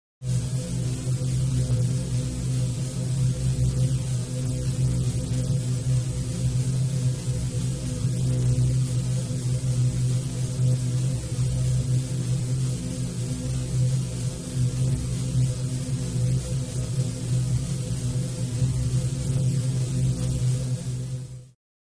Low Frequency Synth Hum With Force Field Or Electric Energy Radiation Sound, Pulsating.